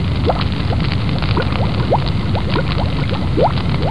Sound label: lava bubbling